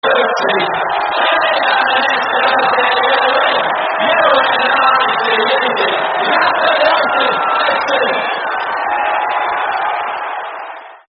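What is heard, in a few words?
N E Wubble U C (No Cheer), football terrace chant; Newcastle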